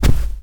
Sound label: Rug Run; moul